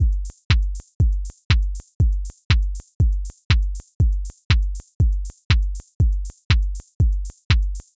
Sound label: 120 bpm 4 bars deep house drum loop with soft hats